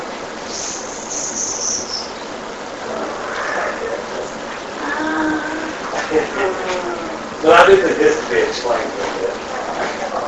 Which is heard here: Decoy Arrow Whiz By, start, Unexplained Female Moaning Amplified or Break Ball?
Unexplained Female Moaning Amplified